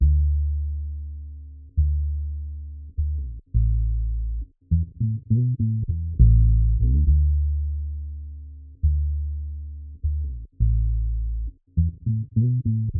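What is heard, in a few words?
68 BPM A Major Bass, Guitar; Melodies; Loop Stems; Avenue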